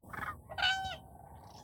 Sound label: Whopping Crane